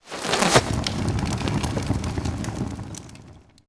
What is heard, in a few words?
arrow fire arrow